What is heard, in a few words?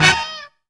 CRAZY HIT, Funky Elements, HITS STABS; Zero-G